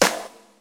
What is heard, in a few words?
airy steel snare drum sample f key, steel snare drum tuned to the F note; snare drum samples